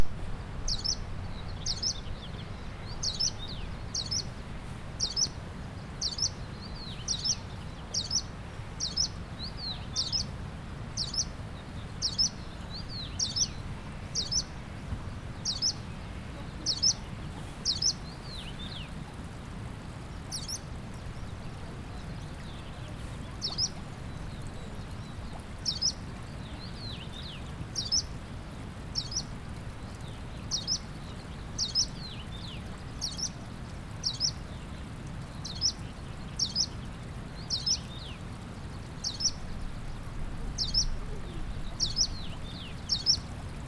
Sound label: warbler hume leaf Phylloscopus humei